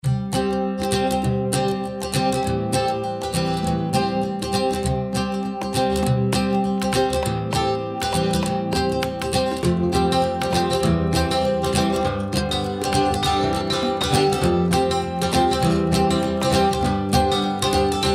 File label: HAPPY COUNTRY SONG